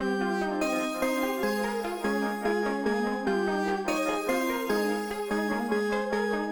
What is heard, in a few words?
all melody with fx